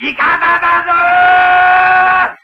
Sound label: Heralding; Sound FX